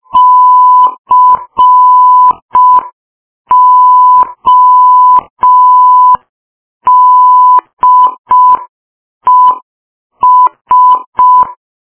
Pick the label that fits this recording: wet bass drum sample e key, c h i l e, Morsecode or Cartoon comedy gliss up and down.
Morsecode